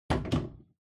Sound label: Pendulum Clock Hardware Hook Hang